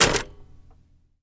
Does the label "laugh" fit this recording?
No